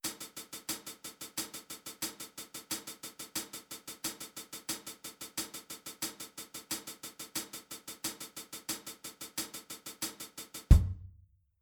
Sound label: Groove hihat; Snare